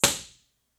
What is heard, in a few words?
IR